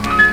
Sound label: Piano Lick; Mo' Phatt; Digital Sound Factory Mo' Phatt